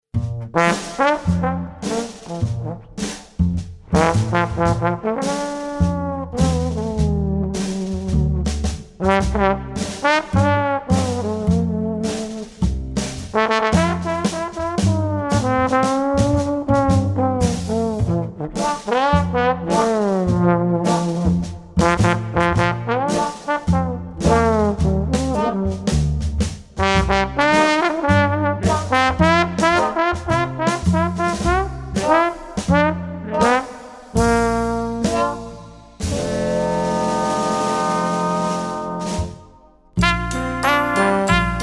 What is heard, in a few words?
Jingle Bells